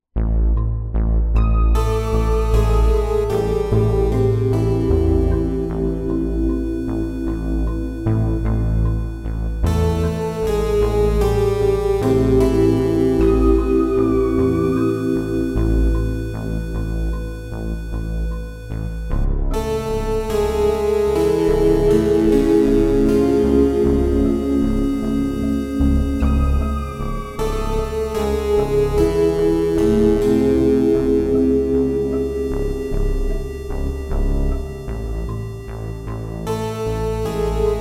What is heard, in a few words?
Crack House Interior; Police Quest 3 The Kindred